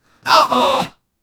male effort grunt

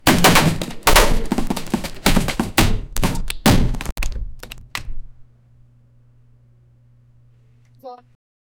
thudding and crashing, violence

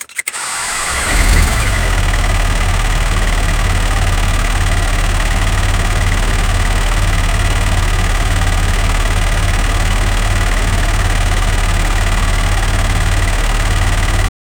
engine 2 cylinder, petrol

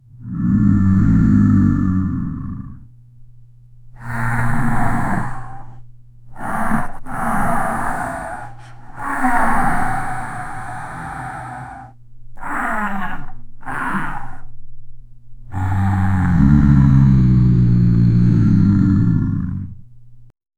a monster like creature t, chase

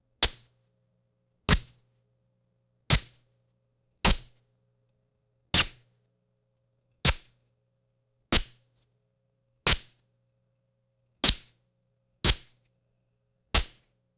many swipes of claws, fabric

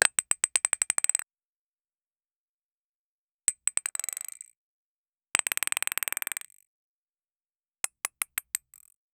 a cube when fall on, object

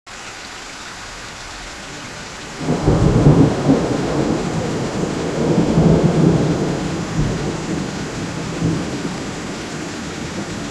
the sound of rain on, water surface

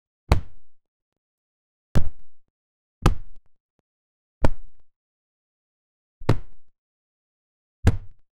sharp light impact sounds

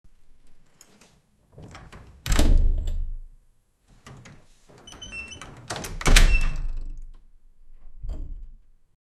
unlocking wooden door sou

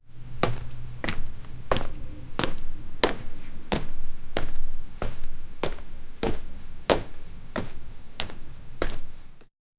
walking, foley

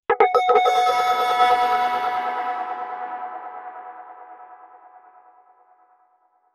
delay effect light god; ra

